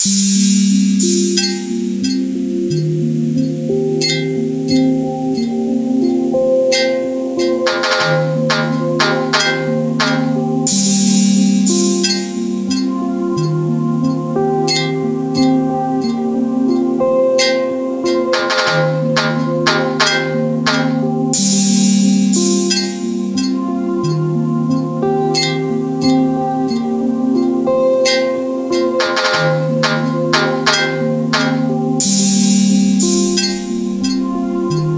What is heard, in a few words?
relaxing ambiance